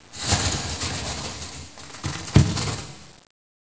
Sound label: catacomb; gearbox